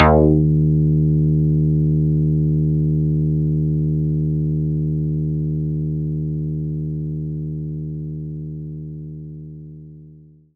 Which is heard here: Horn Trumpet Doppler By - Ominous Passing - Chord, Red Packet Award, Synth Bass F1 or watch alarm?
Synth Bass F1